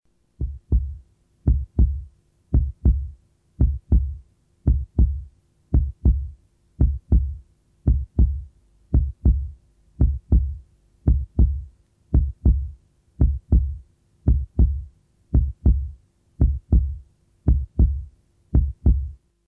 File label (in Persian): Ghalb; Vocal&Effect